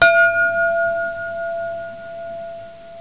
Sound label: CRYSTAL, BELLS